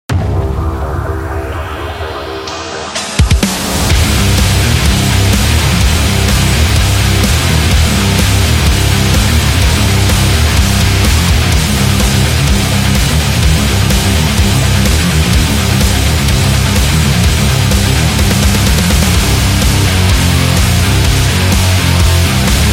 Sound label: Rock Energy